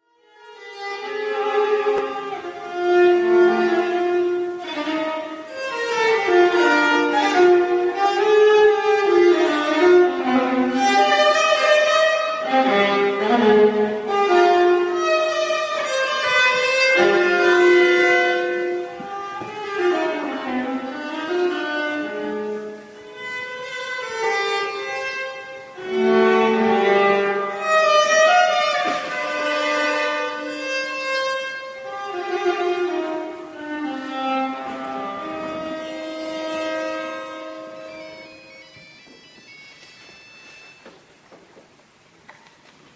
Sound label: Nokia Call